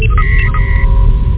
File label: hailing, startrek